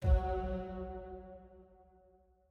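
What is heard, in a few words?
Stinger Neutral F sharp; Desert SFX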